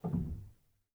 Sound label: rel, Grand Piano; bitKlavier Grand; Piano Bar